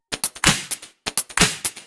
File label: Electro Loop 128BPM; Vengeance Samples